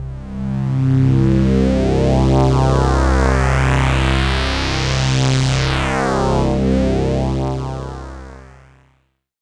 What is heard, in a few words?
Open Sweep, Pads; Jungle; Jungle Warfare 1&2